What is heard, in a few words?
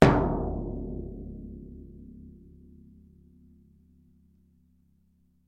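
drum solo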